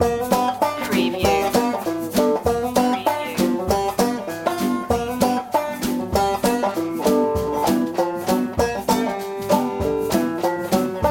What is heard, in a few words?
catfishin loop